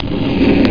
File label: HUM